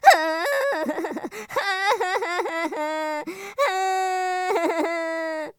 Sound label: Cartoon Sad Crying